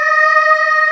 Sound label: Choir; AKAI S-Series CD-ROM Sound Library VOL-2; CHO HARP